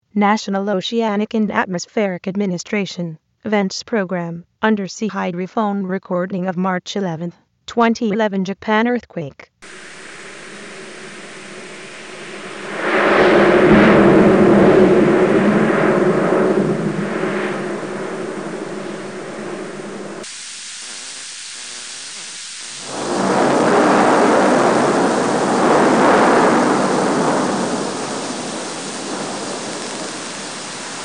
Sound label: vents hydrophone recordings; soundscapes